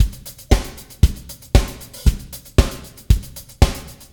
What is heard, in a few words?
116bpm 8beats; LoopsBitsSamples